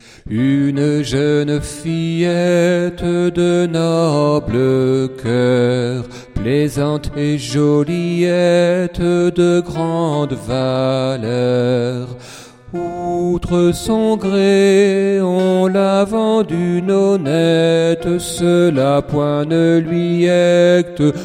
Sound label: alto; Une jeune fillette; Anonyme